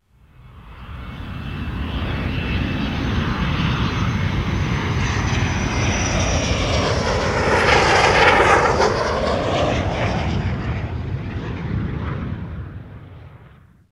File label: distant rockets; nimbus station